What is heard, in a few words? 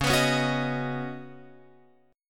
C sharp 9th Suspended 4th C sharp down Guitar Open F, chord; C#9sus4; strummed